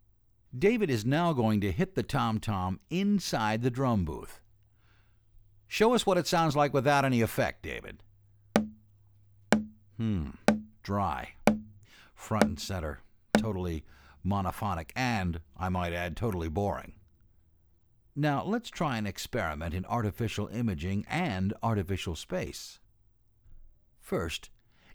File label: Artificial Stereo Imaging